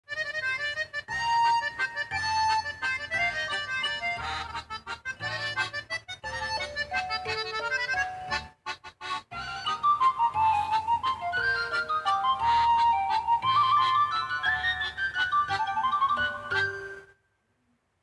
Accordion

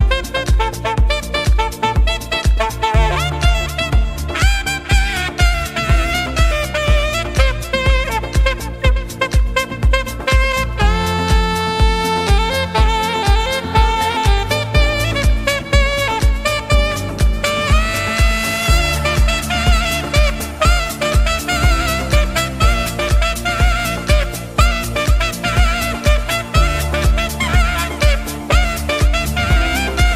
Sax

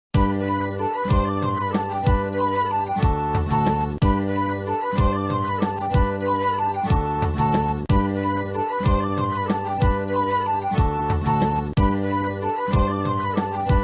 Medieval Jaunt

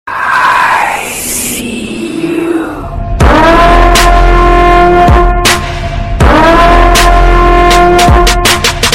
siren remix

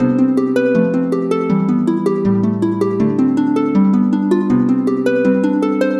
Harp

